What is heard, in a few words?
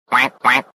quack; gunter